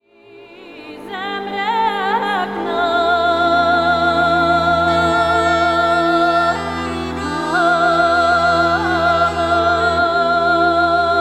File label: female choir, bulgares; harvest song